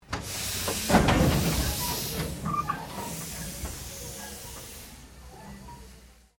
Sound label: Steamtrain Lurching; Bellarine Tourist railway vehicles; Trains